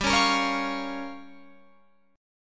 G Minor 7th Flat 5th, Guitar Open F; Gm7b5; strummed; 19,20,22,20,20,20

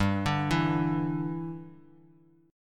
G 5th 6th G, chord; G56 Chord {3 5 2 X X X} arpeggiated

